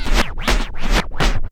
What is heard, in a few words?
SNARE SCRA, Vinyl Scratches